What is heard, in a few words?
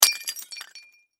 Crash Ceramic Coffee Mug Drop Break; Crashes; Sound Effects:Objects